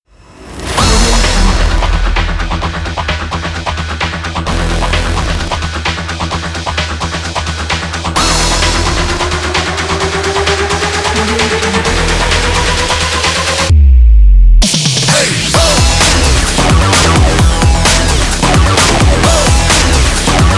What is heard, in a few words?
rave